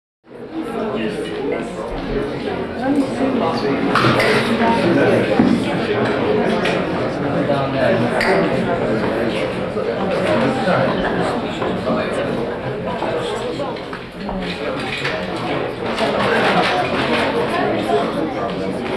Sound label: Interior Mixed Crowd, chatter walla